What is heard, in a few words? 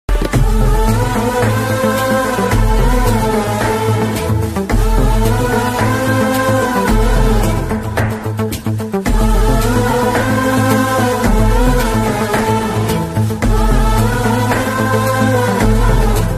bgm alone